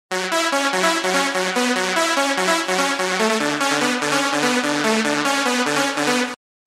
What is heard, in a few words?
ld synth; 0’14～から入ってくるリードシンセは、コードを弾いているものと同じ[Dig-E-Lead]で、こちらはプリセットのまま使用。